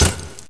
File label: hit wall; SAS: Zombie Assault 4